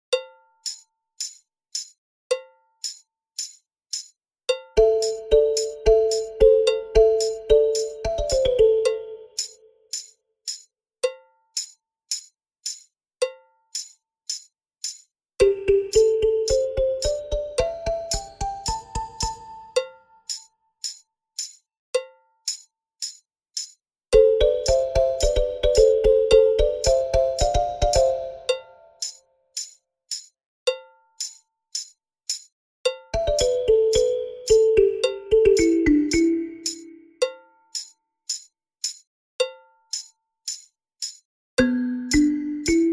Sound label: balafon